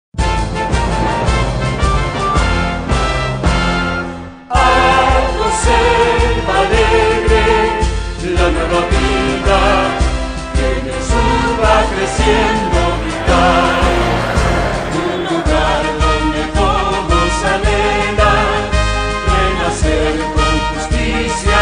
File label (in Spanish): Himno; Alto Selva Alegre